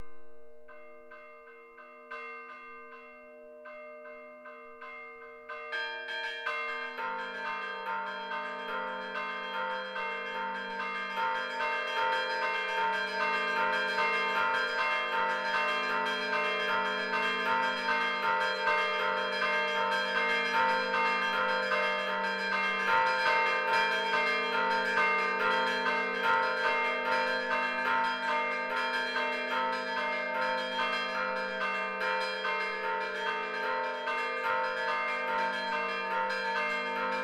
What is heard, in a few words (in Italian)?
SUONATA di gloria per le feste, campane; Le campane del campanile di Santa Maria del Piano